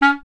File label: clarinet C#, Solo Instruments; Miroslav Vitous - Solo Instruments VOL-1; 90 s Sample CDs